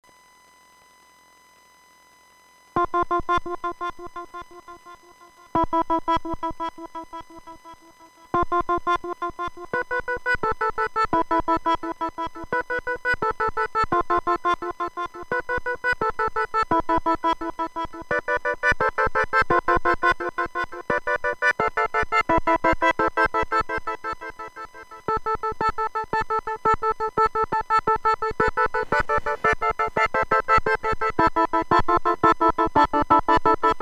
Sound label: ambient